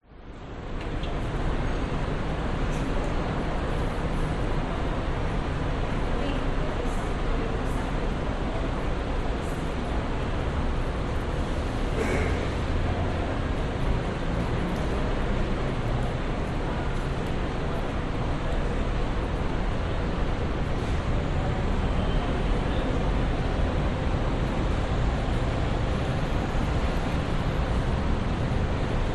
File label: city empty cafe square area no people city hum outside; public places